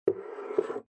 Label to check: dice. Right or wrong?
Right